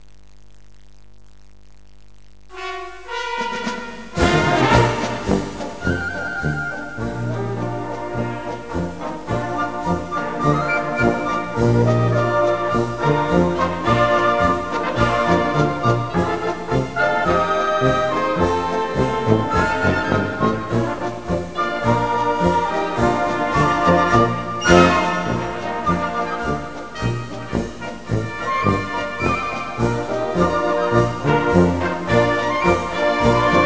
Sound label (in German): feuerwehr